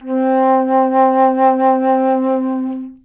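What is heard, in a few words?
flute alto C vibrato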